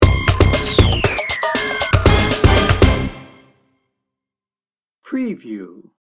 industrial innovation sting bumper

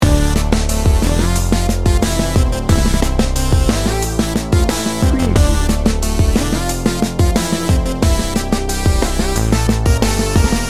urban adventure loop

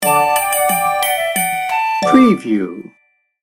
wheels on the bus sting bumper

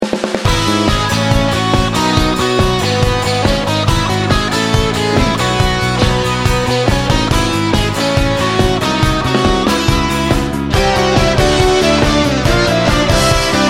positive outlook

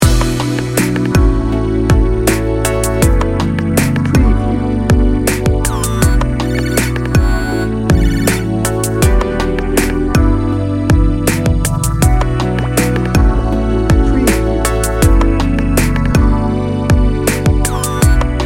dreamy addiction loop

